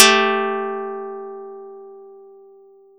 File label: Audacity pluck